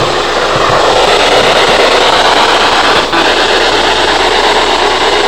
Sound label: Spirit Box; evidence